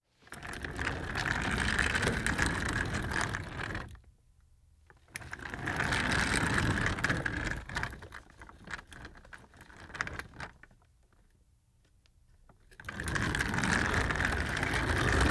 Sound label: Cart movement; Fairlight Sound Lib